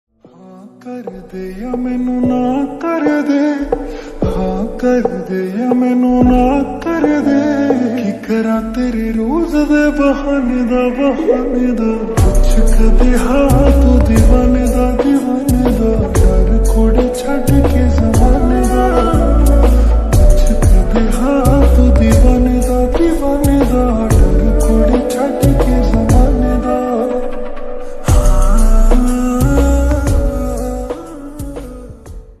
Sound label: Slowed Reverb Ringtone